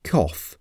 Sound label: cough; to cough; kaszleć